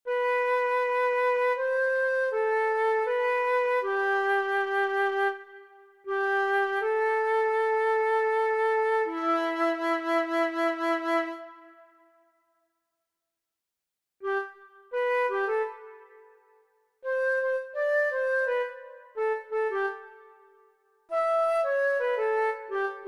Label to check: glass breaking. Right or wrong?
Wrong